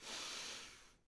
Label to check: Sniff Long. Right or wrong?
Right